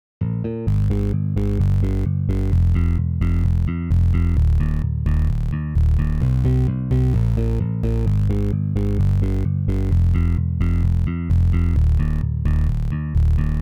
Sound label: bass, 130BPM; Pony Fan Music Remix Stems